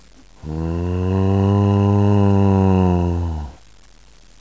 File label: huummmmm, zombie; brainbread